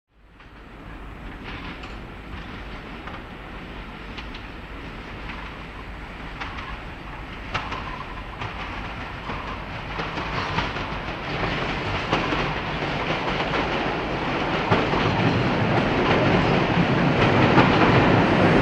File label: Electric Train Into Station, Busy Doors, Brake Pump.